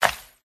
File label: fur tree attack contact up